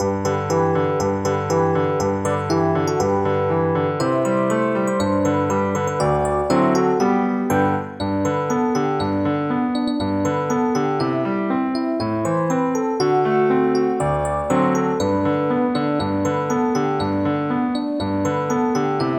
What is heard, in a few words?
jingle